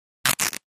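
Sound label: Cloth Split Rip Tear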